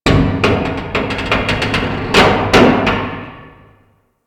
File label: Metal Creak; Ambience; Interior; Warframe; Orokin Derelict